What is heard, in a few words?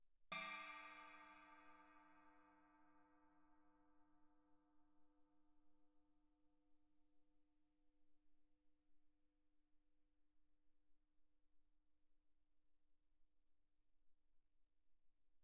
chinese stick normal mf, suspended cymbals; Percussion